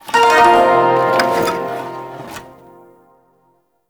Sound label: Shawzin Un Equip; Warframe